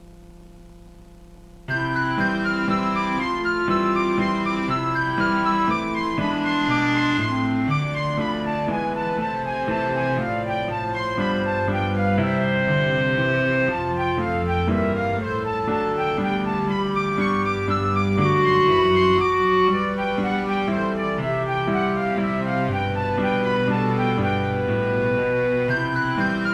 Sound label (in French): tendre menuet